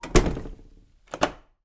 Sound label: household door front shut internal lock; zapsplat